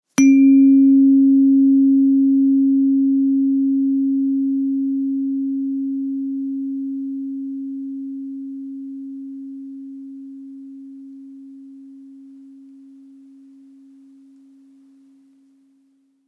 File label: tuning forks; zen planetary chakra tuning forks set of 8